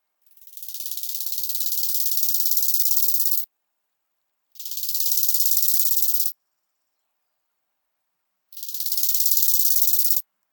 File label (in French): criquet melodieux stridulation typique; Le Puech Cantal